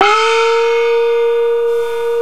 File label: ENSONIQ OPERA GONG